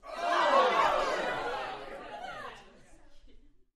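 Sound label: Crowd 15 Interior Rowdy; small int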